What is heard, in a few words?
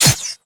katana sword